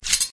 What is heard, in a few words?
sword